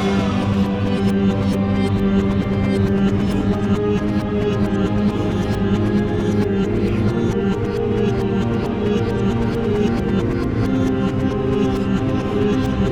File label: prison start loop; corrupted mode